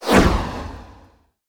Powerful Flanged Whoosh

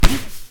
Hit

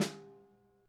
Snare Low Vol

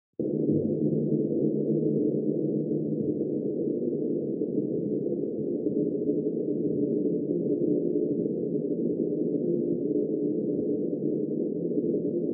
War Drone Ambience

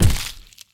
Heavy Impact

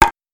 Wooden

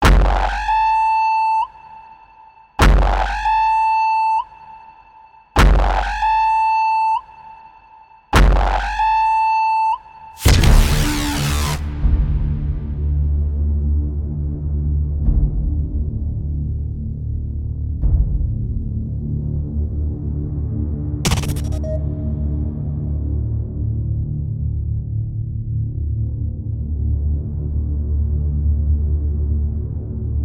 Epic Sci Fi Trailer Music

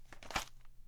Newspaper Down

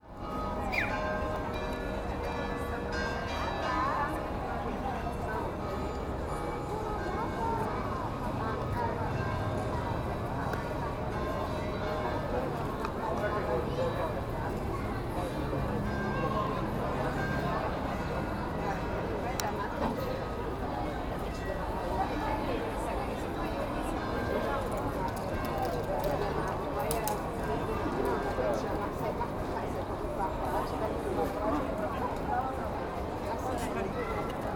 Street Genova

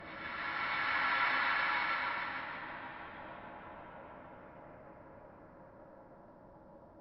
Horror Moment

